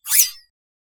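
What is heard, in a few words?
single crisp snip of magic, puzzle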